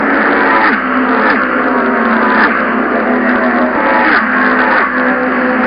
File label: Full Throttle; floored